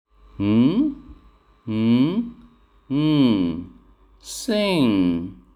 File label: sing